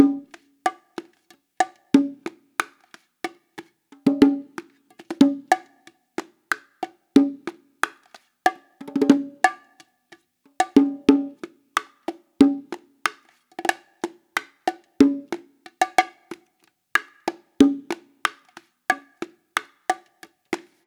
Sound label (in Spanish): bolero 46 bongo